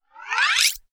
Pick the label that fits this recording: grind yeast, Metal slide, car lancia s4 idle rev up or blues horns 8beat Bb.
Metal slide